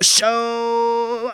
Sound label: "chow", Funky Vocals; USB Soundscan vol.18; TB MELODY